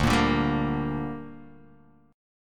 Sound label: D Suspended 4th Sharp 5th